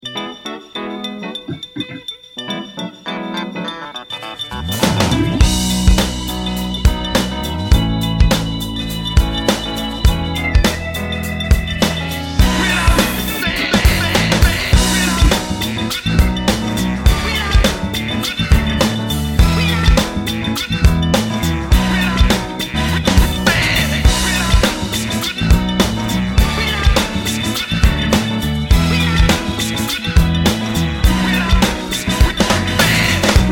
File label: wurlitzer